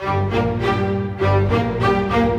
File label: Strings; Sonic Foundry (Sony Creative Software); Orchestral VOL-2; Modern 32